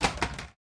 Drop Clipboard

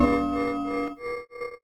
Warning HUD

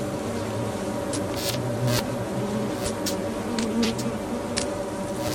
Bees Angry

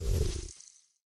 Stun Flowerman

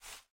Rustle

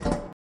Shovel Pick Up

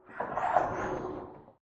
bump outside ship

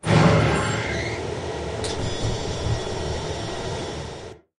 Finish Charging; Lethal Company

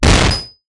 flashbang explode

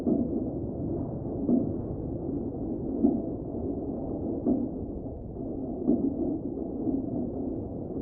Pipe Flowing

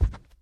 Carpet

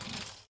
Pull Cord

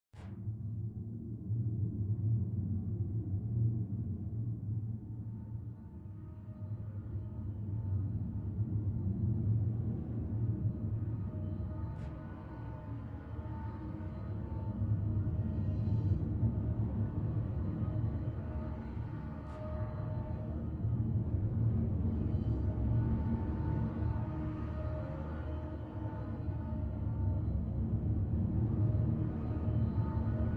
Big Factory Inside; Lethal Company

